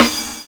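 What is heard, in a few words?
OLD GROOVE; Univers Sons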